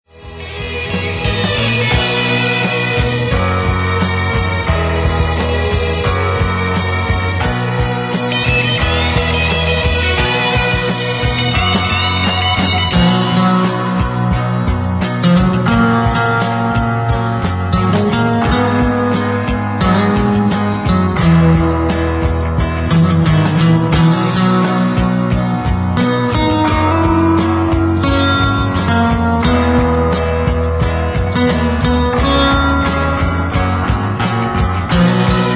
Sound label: instr